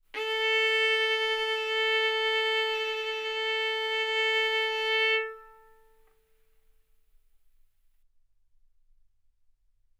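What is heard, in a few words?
Violin arco mf A string A4 B4 stereo; sul A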